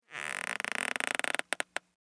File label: Foley Squeak Stress Slow; Objects; SFX Bible